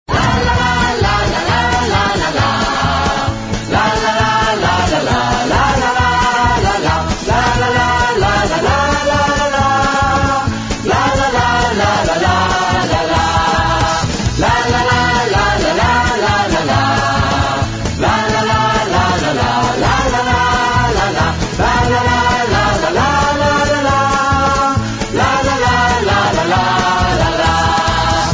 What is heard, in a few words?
Lalalalalalalalala